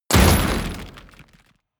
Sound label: explosion dirt metal short; explosionen; comedy-cartoon; soundpool